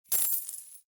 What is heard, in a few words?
win